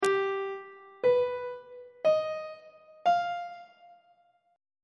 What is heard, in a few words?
g augmented 7th chord intervals; on treble clef